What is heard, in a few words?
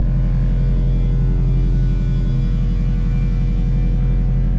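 ambience stasis field; Star Wars Knights Of The Old Republic II; XBOX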